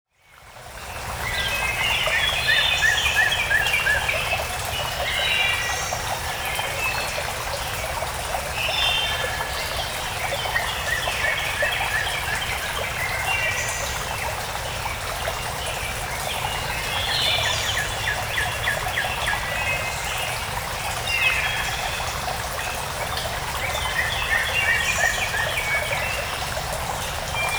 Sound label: Woods; Jecklin; soundscapes